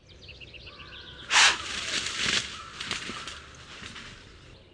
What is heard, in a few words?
alert calls deer sound